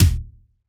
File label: Elec Kick; Roland BK-5